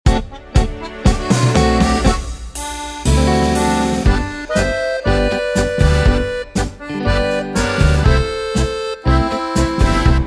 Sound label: 60s Tango